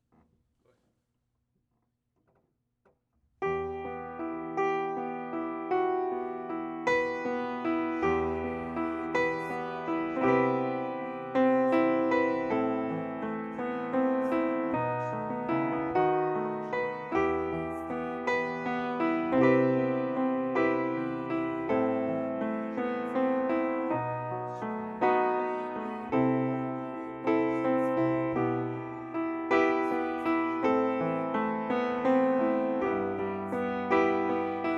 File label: piano